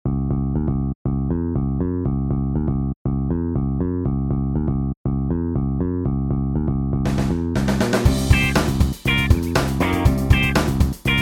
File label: Funky Monk Rock